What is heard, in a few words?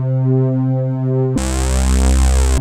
JP SYNTHS; Pro-Rec - Synthsonic; JV ANALOG2